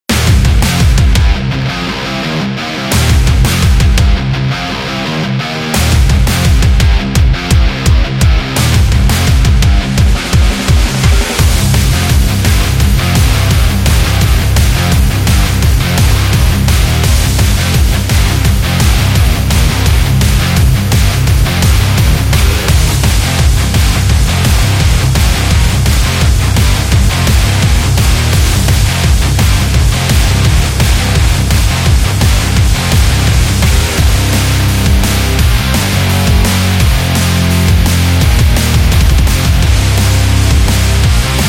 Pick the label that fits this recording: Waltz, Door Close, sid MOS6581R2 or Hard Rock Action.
Hard Rock Action